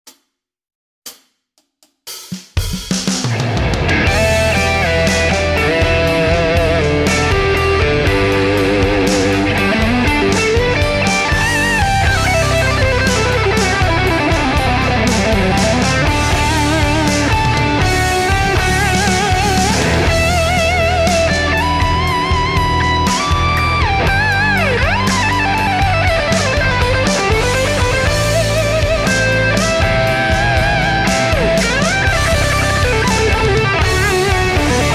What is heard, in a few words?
Blues, Guitar Techniques; Guitar Techniques 2019 Issue 297 July